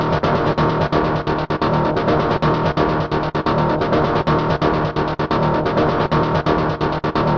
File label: psytrance panning arpeggios, synth and electronic loops